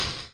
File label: wizard lightningbolt; Adventures in Lestoria; action RPG game; Sig Productions